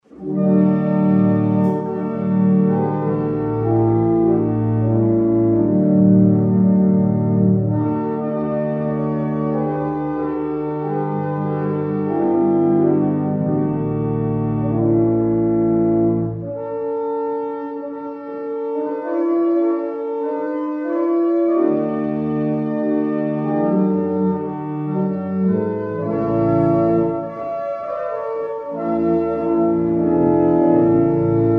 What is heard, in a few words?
tune, barrel organs; Barnston; Cranbrook